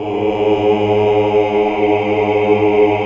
AAH, Hallelujah

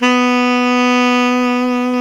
SAX Tenor Breathy B 3

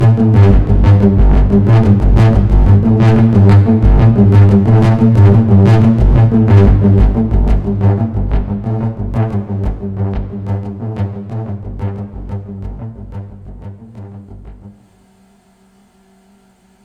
modular brass

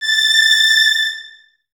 Violins Detache A 6; Miroslav Vitous; String Ensembles

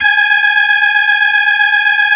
G#5F, B3, Vintage Keyboards

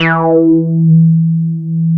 E4, Bass; E-MU Producer Series Vol. 6 – Analogia Project (CD 1); Boom da Bass 2

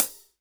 HH WET, Drum Mashines, SR-16 DRUMS; EdgeSounds; Drum Mashines VOL-1

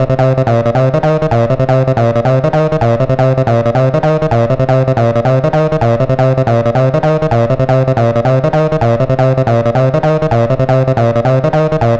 Acid Loops, 160; Dance Synths; Hardcore Loops

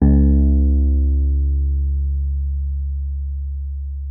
WURLITZER C#2; Xsample VOL-1; WURLITZER B

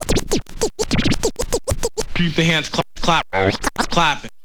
scratch short, Vinyl scratches

